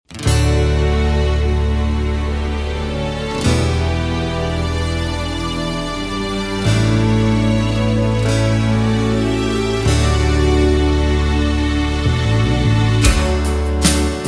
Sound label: (D) No Backing Vocals